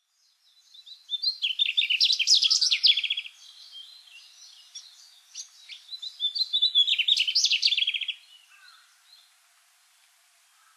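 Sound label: mugimaki flycatcher, hyogo; koe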